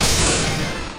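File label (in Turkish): slot oyununda sembol patlama, slot machine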